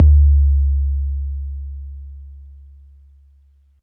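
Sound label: bass drum 808 MPC60 Click C#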